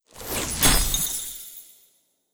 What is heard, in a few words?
Attack Elfe